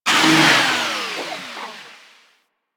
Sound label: Research Facil Repair Robot Power Off; Warframe; Ambience; Venus Open World; Objective Rooms; Props One Offs